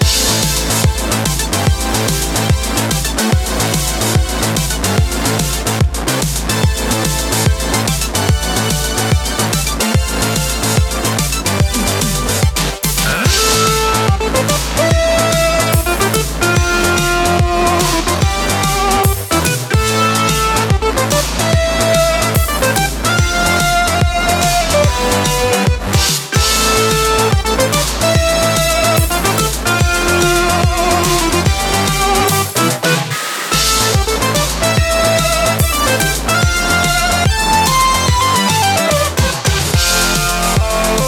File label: factory Loop